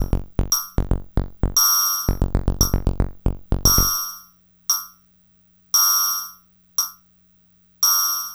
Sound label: Glitchy, Beats